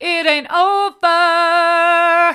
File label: it an't over mid, vocal